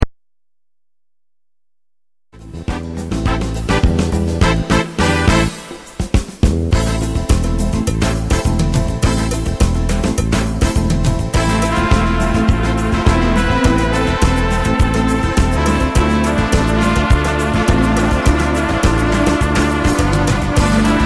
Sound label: samba; karibik